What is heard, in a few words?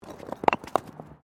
Bricks Movement; Material components for constructing more complex sounds; Rocks; Materials